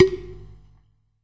Small pot BM, Clay Pots, ethnic and world percussion